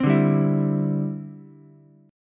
D 9th Suspended 4th D down Ukulele C6; strummed; JGuitar

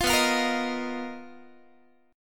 B Major 7th Flat 5th B down Guitar; strummed

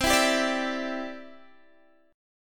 C Major Add 9th, C, down, Guitar, Standard; strummed; JGuitar

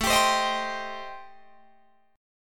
A-Minor 7th Flat 5th, Guitar Drop D, chord

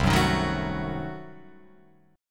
C Diminished 7th down Guitar Open F; strummed; JGuitar

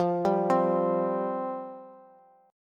F Suspended 2nd F Bass; Fsus2 arpeggiated; JGuitar

